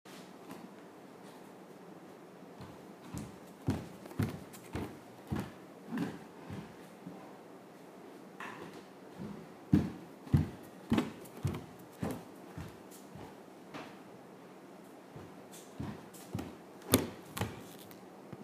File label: footsteps